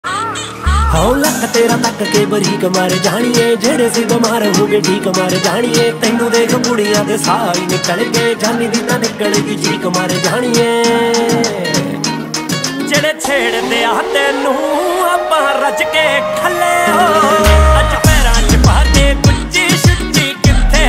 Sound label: punjabi ringtone